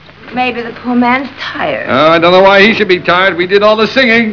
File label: Tired Singing; topper